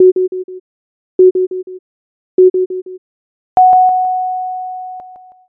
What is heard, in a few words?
jiho